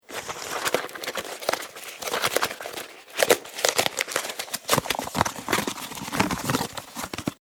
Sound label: Opening of cardboard box/packet; Cardboard (Sound Effects:Materials)